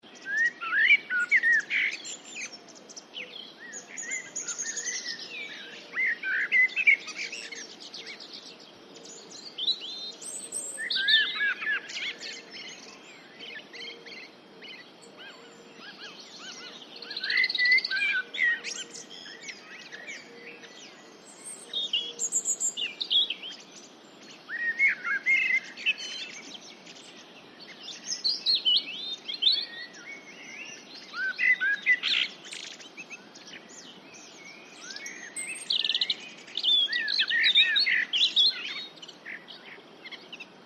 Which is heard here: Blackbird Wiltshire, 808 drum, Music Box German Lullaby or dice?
Blackbird Wiltshire